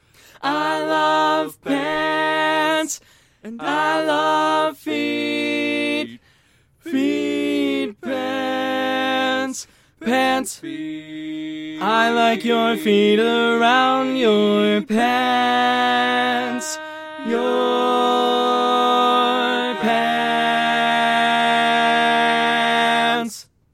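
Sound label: baritone, barbershop tags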